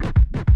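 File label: scratch L, vinyl FX; E-Lab; X Static Goldmine 2; akai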